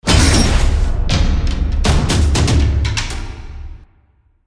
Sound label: Unlock Level; Shadow Blade Reload